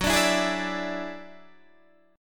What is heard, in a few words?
F sharp 7th Suspended 2nd Sharp 5th F sharp down Guitar Open D